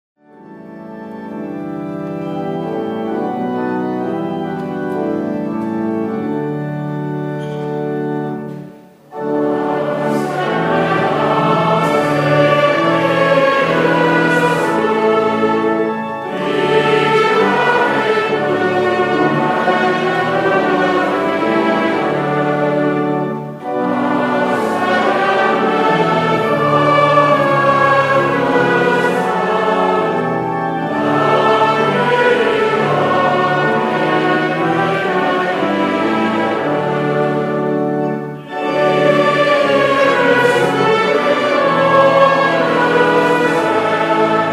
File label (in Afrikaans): Gemeentesang